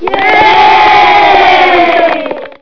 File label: bonus; njam